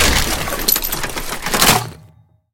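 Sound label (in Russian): тяжёлый звонкий железный грохот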